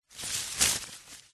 magic plant vines leaves movement wrap around grab reversed